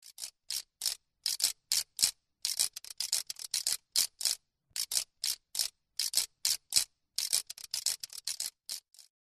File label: Kokiriko